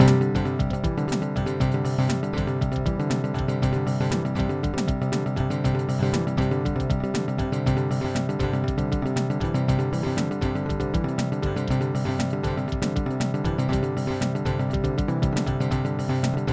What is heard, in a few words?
industrial style; SuperJAM!